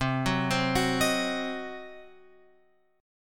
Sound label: C Diminished C; Cdim Chord {8 9 10 11 X 11} arpeggiated